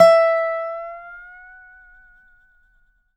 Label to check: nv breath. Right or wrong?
Wrong